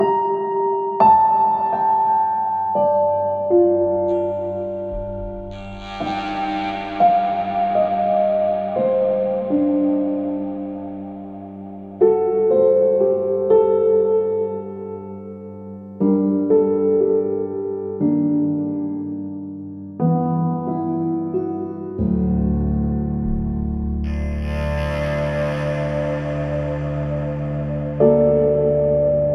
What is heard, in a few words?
Overture Piano INSTRUMENTAL